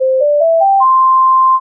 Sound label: login